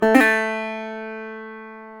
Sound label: GTR, Dobros, National